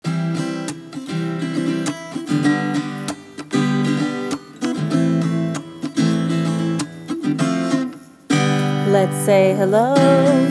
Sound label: Heartbeat